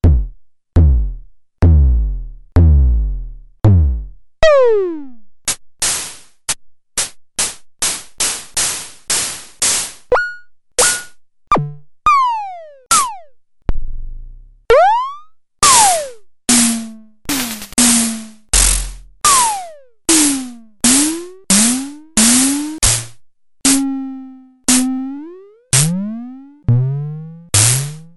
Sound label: Drumfire DF-500; B-D